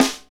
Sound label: snare, Hip-Hop Rap; Northstar; Drumscapes Roland